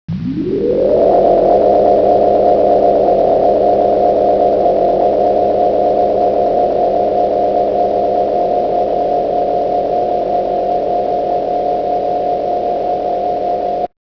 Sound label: saucer